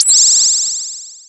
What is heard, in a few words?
Entrance; Zelda's fight entrance